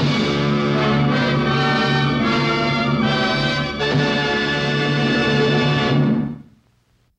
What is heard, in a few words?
fanfare majesty